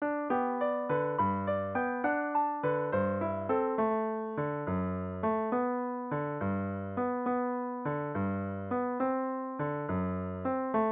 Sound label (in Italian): Pastorale per Pianoforte